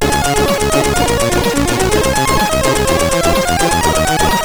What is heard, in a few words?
sid CSG8580R5